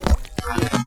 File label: invader alien scifi texture; Bluezone Corporation